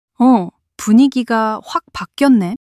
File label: surprise